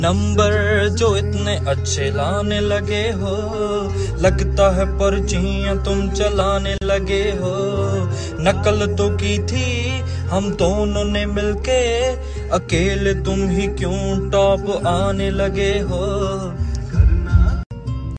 bollywood music ringtone

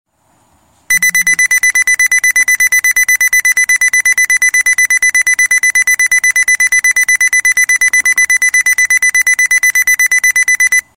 active 911 long beep, ringtone

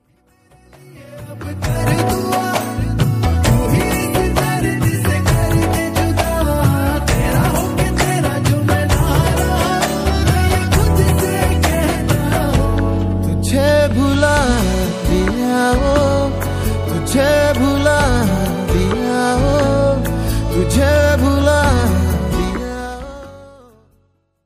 hindi music mix ringtone

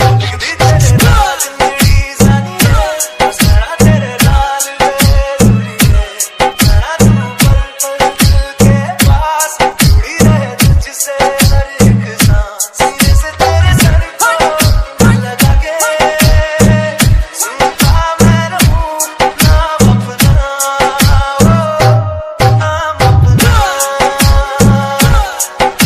fabulous dj mix ringtone, bollywood ringtones

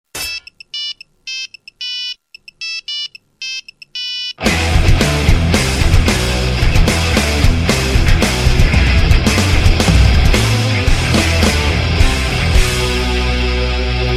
nokia electric guitar ringtone

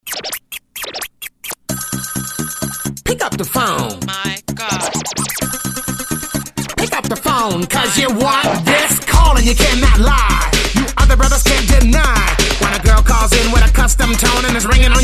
pick up the phone funny musical tone ringtone

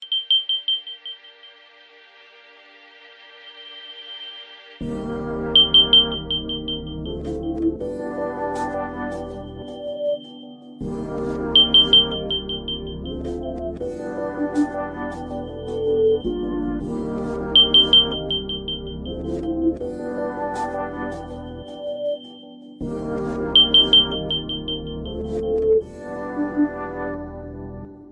clock alert ringtone

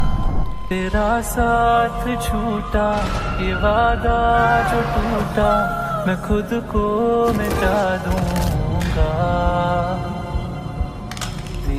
romantic love ringtone, bollywood ringtones

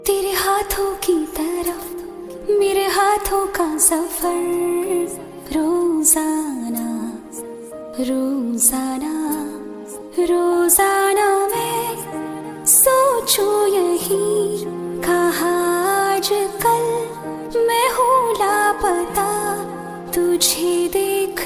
female version love ringtone, bollywood; bollywood ringtones

